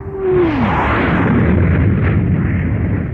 Starship Jet By

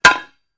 Metal Impacts Dull, pipes

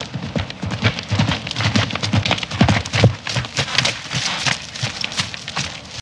Horse Gallop In Stop

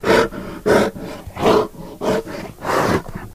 Kodiak Breath Loop, kodiak bear

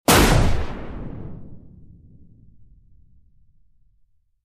Gun Shot Lrg Hvy Sho, shots

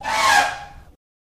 Elephant Trumpet, elephants

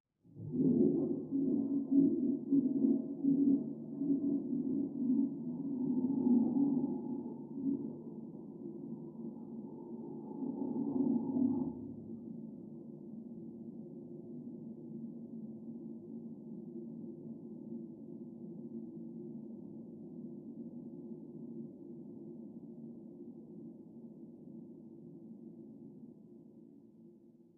Toilet Flush Water, pipes

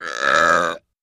Belch Loud; belching

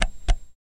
Beeper Switch Click; switches

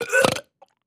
Nasty Gag Belch

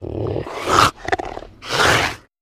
Puma Cougar Gag Long G, big cats cougars

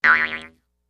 Jews Harp Shrt Vibr, boings

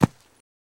Horse Hoof Thud

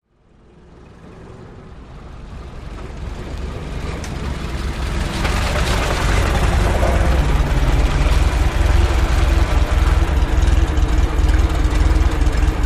Model T Idle Away, ford model t

